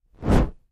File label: Torch Movement; torches